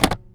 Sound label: Plastic Thump; BB Retail Therapy Sample Pack